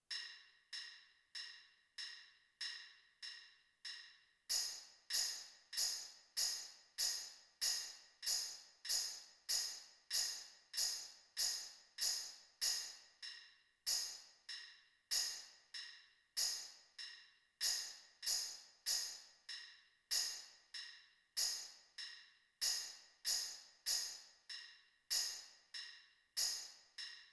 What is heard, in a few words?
tambourine